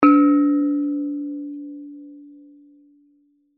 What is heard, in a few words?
musical instrument bonang gamelan hit soft